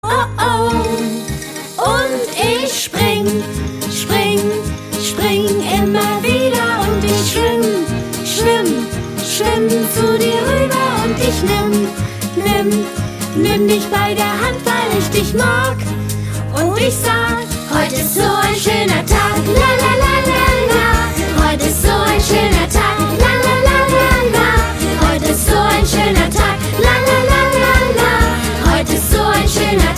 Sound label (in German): Kindergarten und Mitmachlieder; Bewegen